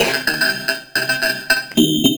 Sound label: Teck Bass; Sonic Foundry (Sony Creative Software); Synchro Funk